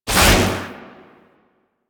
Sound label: Cloak Pulse End; Powers; Warframe; Focus; Operators